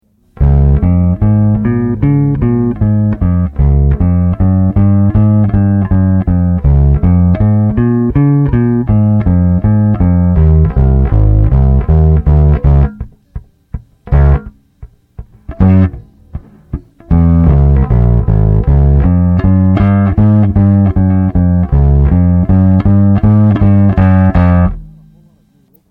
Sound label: Bass Rock